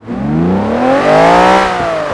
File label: first, vehicles cars; v12vantage; tdmcars